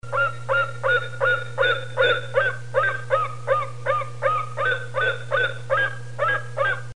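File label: Berkut, golden eagle; Animals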